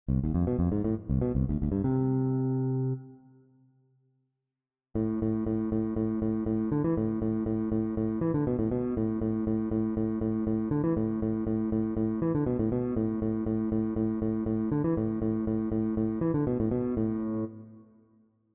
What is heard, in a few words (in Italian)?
Esempio di RIFF E LICK, walking bass